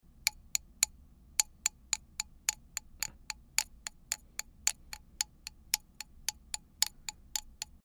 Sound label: wood hit